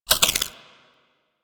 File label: Freeze Ray Mech Chamber; Warframe; Weapons; Corpus